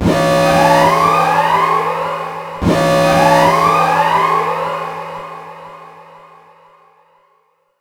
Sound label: buzzer; CosmicRage; CosmicRageSounds; ships misc